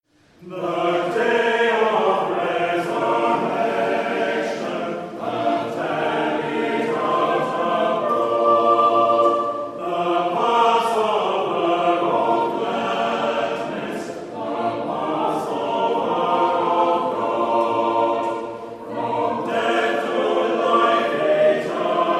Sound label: hymn